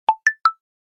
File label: Knock, notification; Coolpad